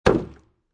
Container Plastic Place Down, Foley, Container, Plastic, Medium Sized, Filled With Liquid Being Placed Down On Concrete; Set Down